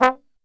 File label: TRMB SHOT, Brass; Best Service; Brass Super Section